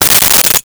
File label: Lightbulb Break; Crashes